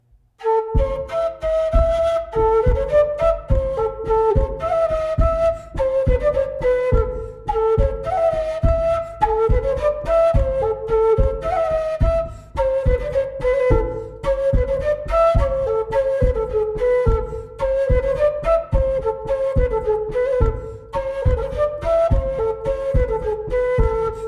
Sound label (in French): Montagnarde; Recueil Laussedat